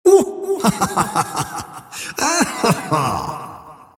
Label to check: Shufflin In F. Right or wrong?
Wrong